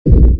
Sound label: stomp; slotwheel flux